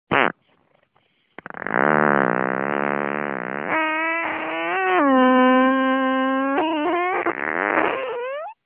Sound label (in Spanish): Colorista, pedo